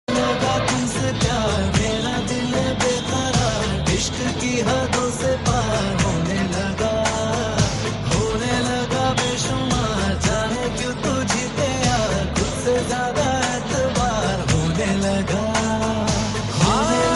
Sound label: Hindi Song